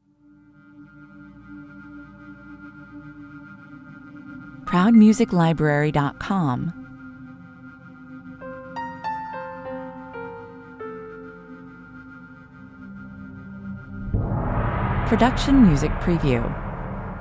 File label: Expressive, Liberated, Triumphant